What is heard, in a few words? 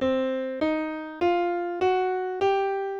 five notes